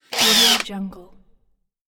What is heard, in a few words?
cordless drill short